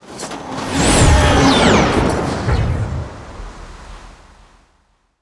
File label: reinforce all, ui; Stellaris